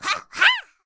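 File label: MON ATTACK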